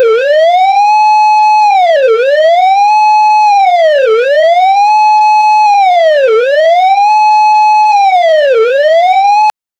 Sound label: reactor alarm failiure